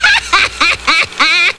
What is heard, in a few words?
laughing; geraeusche menschl